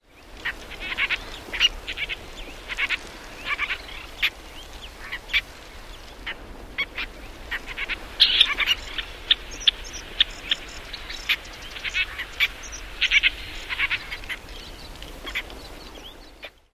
Ruff foraging calls

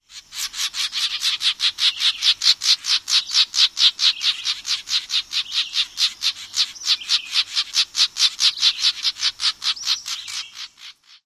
rattle call

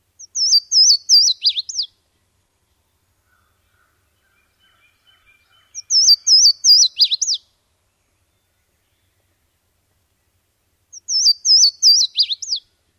songs; KY

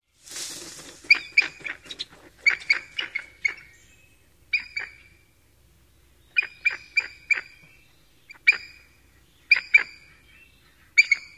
alarm calls given in flight

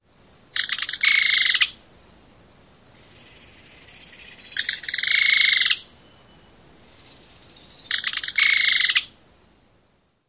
scream call greeting

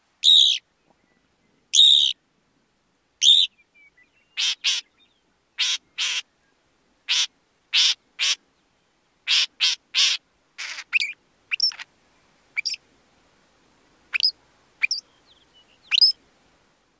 calls song; AZ

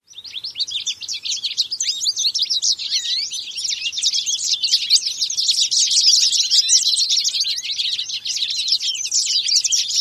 flock singing in spring

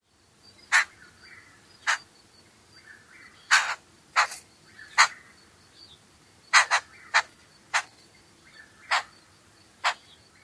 churr-calls

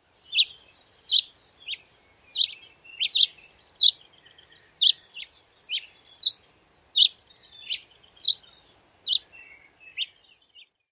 seet calls; NY

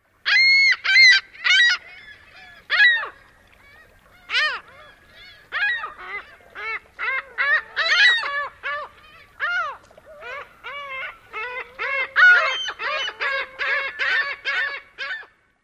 calls

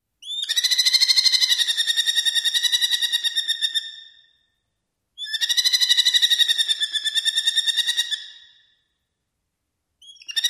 calls of captive male; MERLIN

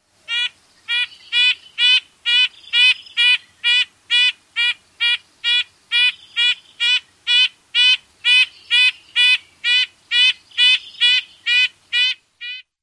toots

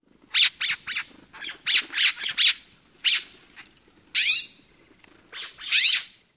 calls

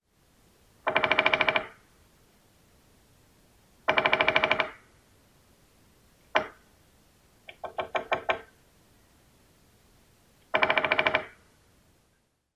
drums

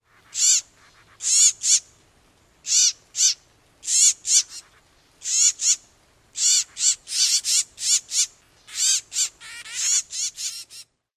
nestling calls

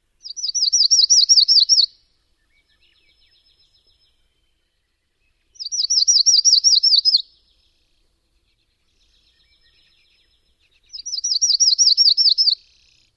Myrtle songs

